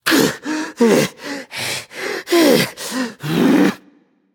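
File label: non-verbal breath; smite; voicepack; jingwei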